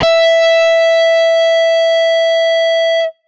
guitar electronic, distortion guitar

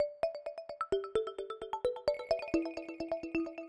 synth, edm samples

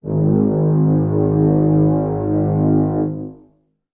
brass acoustic, muted trumpet

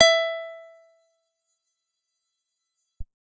guitar acoustic, acoustic guitar nylon

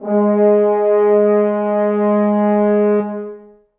brass acoustic, muted trumpet

